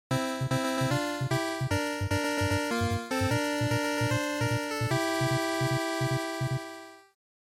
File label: NES Shooter Start